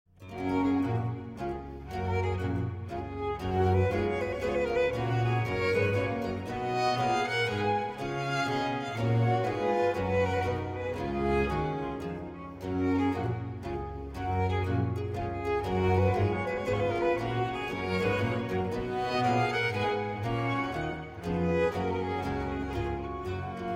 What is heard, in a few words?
Trio Sonata in G Major, Menuet (Allegro Moderato); Op. 5, No. 4, HWV 399